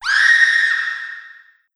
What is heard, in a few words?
Scream